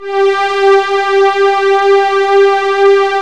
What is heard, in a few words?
CHORUS STRI; E-MU Formula 4000 Series Vol. 2